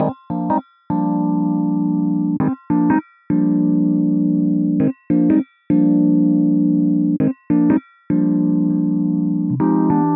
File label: rhodes